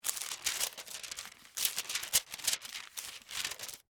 Bible Flip Pages, Bible, Flip, Through, Pages, Book; Foley, Multiple